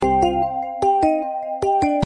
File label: Melody Message